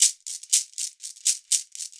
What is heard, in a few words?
DRM